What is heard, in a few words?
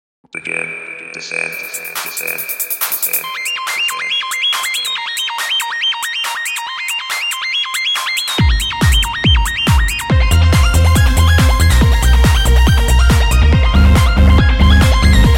Arp